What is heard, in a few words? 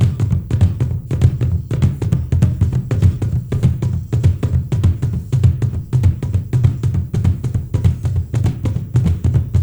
ZULUS, ZULU DRUM; Spectrasonics; Heart of Africa 2